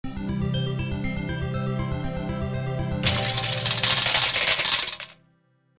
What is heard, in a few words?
Slot machine casino jackpot tease reveal